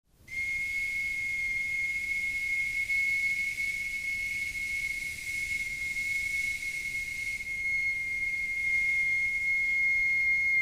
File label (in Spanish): botella silbato antropomorfa